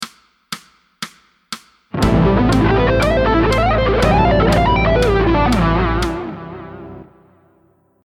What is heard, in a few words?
Lick